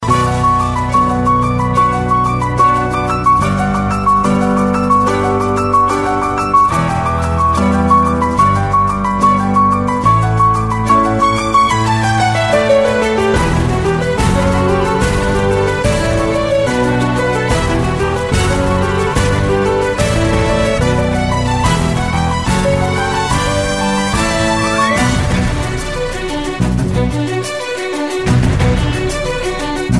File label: Light Ringtone